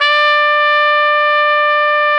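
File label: BRS RCA TP, trumpet RCA jazz; Roland L-CDX-03 Disk 2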